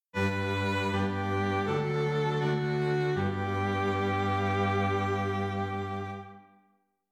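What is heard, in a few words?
string quartet, dictation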